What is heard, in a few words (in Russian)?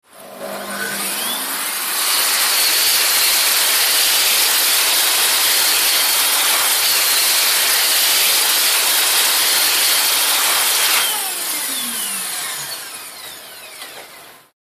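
звук сушилки для рук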